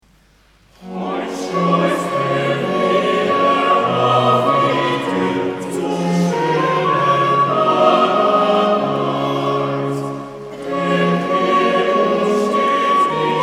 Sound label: Choral; Heut schleusst er wieder auf die Tür; Cantate; J S Bach